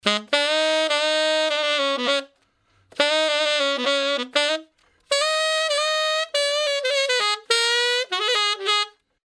brass loop

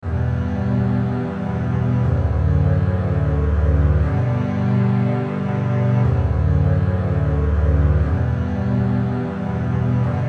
Em120 Song Chords

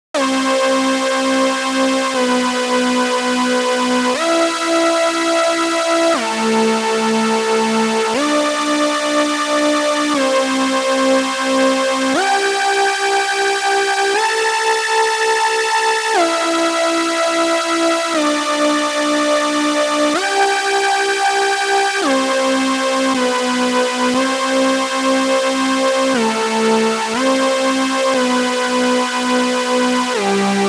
rounds melo

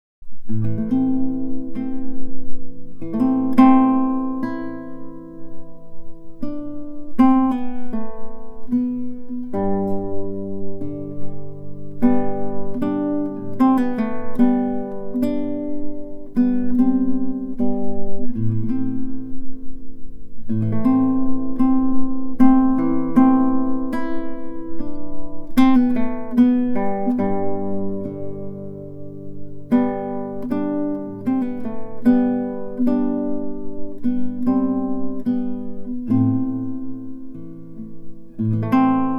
Guitar

